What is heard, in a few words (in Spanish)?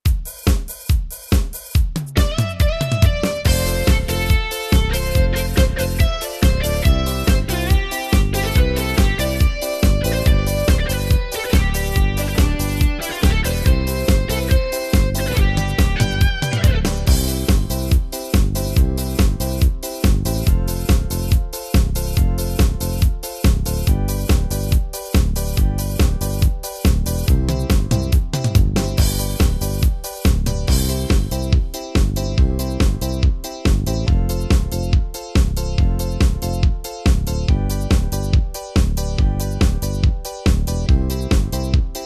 ritmos yamaha marcha gaucha